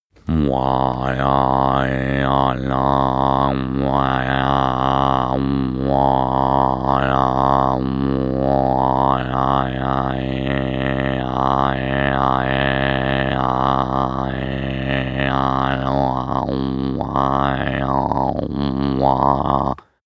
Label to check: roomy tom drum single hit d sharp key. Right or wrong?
Wrong